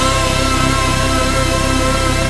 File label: Dance Mega Synth; Best Service; 90 s Sample CDs